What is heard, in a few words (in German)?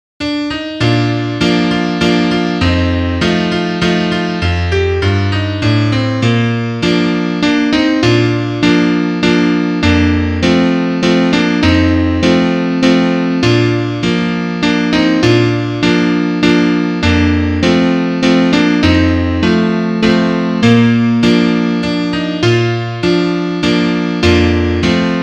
Klavier Solo, trompete; Die Tiroler sind lustig (Duett)